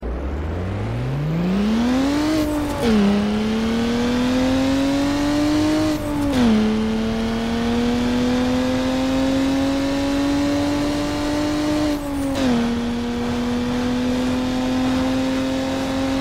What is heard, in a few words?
Car engine; NFSU